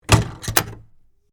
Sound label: Cabinet Door Close, Pad, Lock, Latch; BlastWave